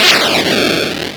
sound explode; arcade-canvas; defender

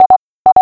Sound Hurry Up; arcade-canvas; ninja-runner

